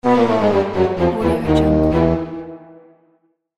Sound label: game over